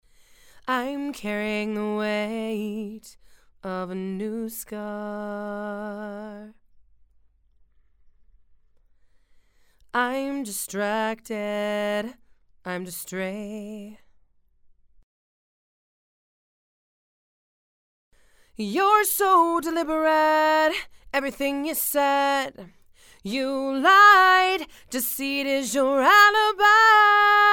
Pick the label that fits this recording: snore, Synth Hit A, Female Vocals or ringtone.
Female Vocals